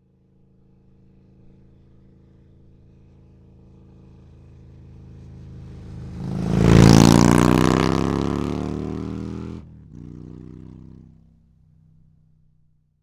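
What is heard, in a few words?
zvuki motocikla, motorcycle sounds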